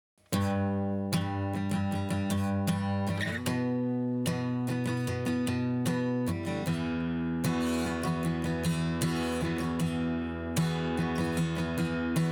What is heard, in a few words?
Ac Gtr Raw